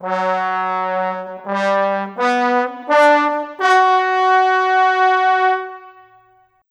Cinematic Trombones; Sonic Foundry (Sony Creative Software); Orchestral Series VOL-3 - Cinematic

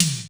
SYN TOM; Crypton - DrumBase5000

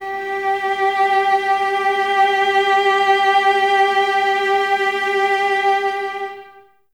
VLS G 4, Violas; Miroslav Vitous; VLS 1+2

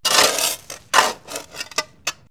Diggin Grave; X-Static Goldmine

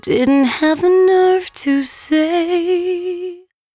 113bpm C#, Velvet Vocal; Zero-G

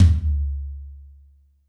F2 - Rock Tom, Yamaha PSR-E323 Rock Kit

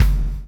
CHAIN; Spectrasonics - Backbeat

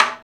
Daiko, Roland R-8

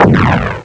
boom; Amiga Soundtracker Sample Packs

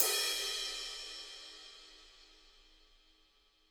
HiHat & Crash, Roland TD-20; HiHats

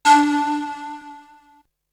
Flute; ZERO G - Datafile DISC 3

